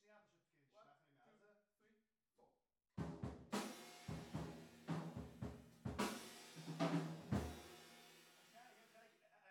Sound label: TB GTR